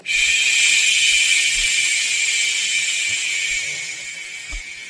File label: fuse